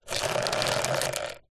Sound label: foley plastic pot containing small debris tip movement; zapsplat